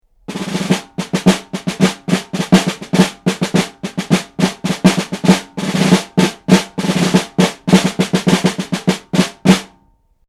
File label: snare